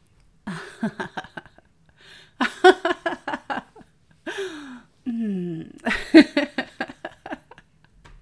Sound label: Voice Sample Laugh